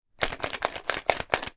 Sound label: household box of pins and needles shake